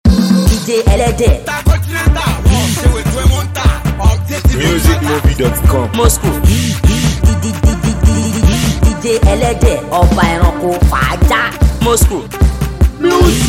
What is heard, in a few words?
Free Beat